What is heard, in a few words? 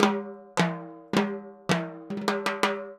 Timbali, Latin Percussion